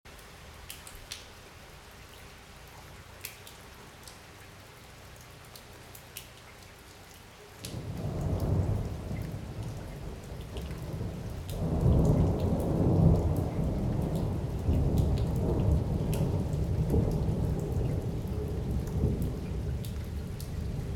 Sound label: France Rain Outside Rue St. Sauveur